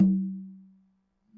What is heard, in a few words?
conga, latin